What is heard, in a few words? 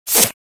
spider attack; granny; zerosiki; slender